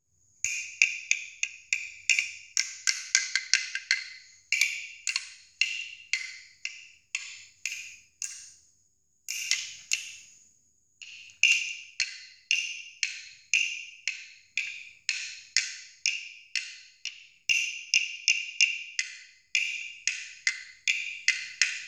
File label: Vocal woodblock; vie de l'ecole